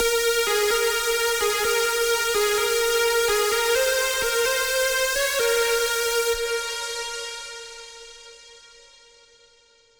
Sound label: Lead Chorus; 128BPM; Pony Fan Music Remix Stems